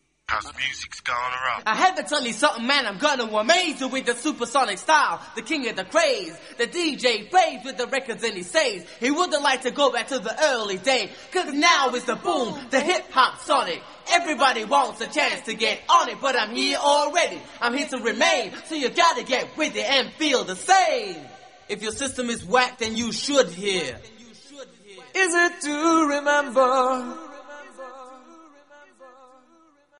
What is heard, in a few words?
acapella